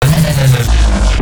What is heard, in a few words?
E Bass Shot; neuro; BIG5AUDIO